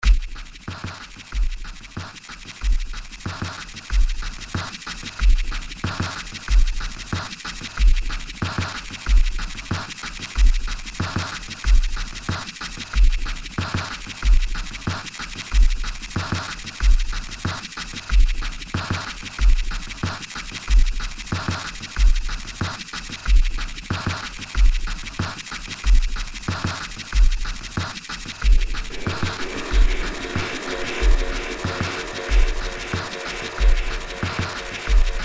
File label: Insect Beat; July 4th